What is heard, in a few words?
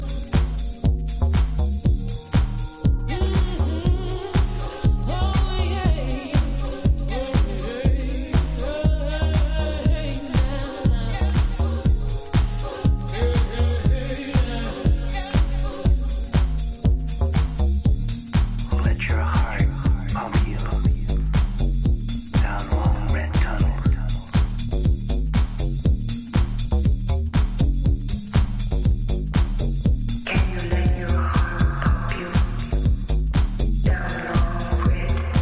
BIGBEAT